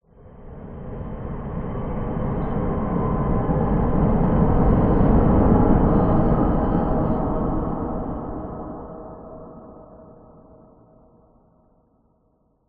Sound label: pass by rumble bass right left background tones swirl; Skyclad Sound